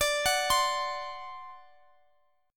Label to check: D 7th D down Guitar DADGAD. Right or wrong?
Right